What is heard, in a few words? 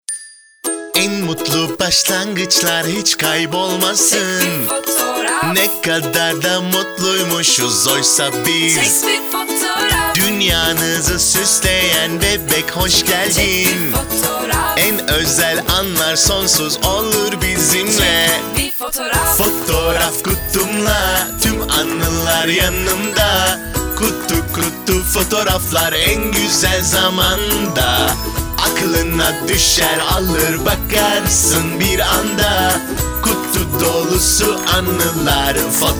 jingle